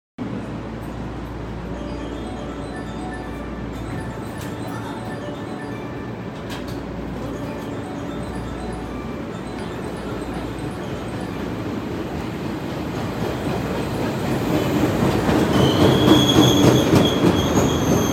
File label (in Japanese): JR大和路線新王子西